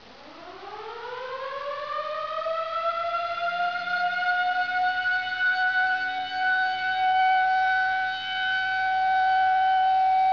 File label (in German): sirene 3 minuten